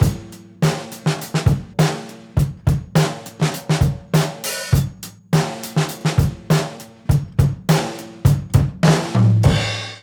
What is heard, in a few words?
drum smash after